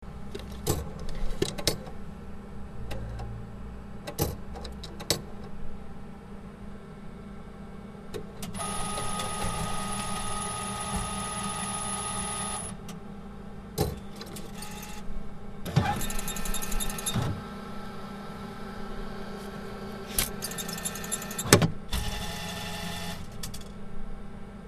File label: atm